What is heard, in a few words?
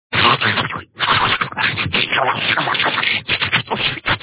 eating